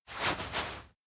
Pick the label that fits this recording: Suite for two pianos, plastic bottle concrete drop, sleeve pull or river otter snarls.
sleeve pull